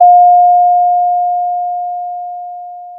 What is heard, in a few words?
Sound of a doorbell